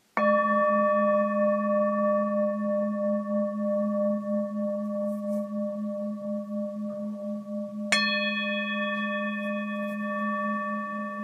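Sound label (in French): bol ancien sol dieze, bols chantants tibétains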